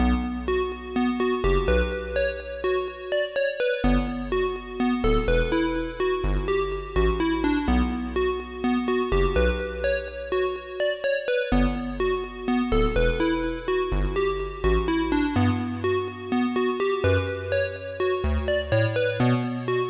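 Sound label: keygen song.xm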